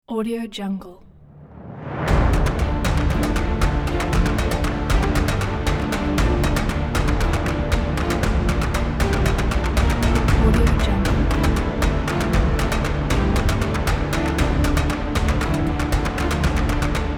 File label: intense action